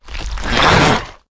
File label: giant spider attack